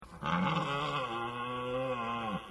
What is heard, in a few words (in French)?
langueur